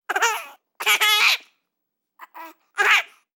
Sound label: baby 5 months laugh